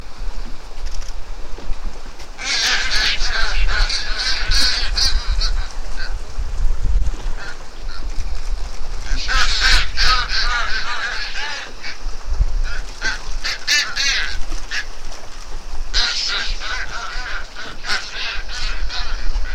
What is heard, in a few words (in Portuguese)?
Atoba pardo